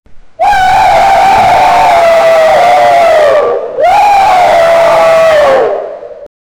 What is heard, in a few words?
a pride of lions roars; animal calls